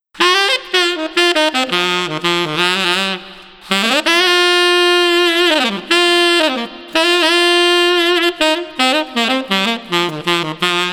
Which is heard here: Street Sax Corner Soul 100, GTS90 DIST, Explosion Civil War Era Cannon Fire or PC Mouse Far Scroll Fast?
Street Sax Corner Soul 100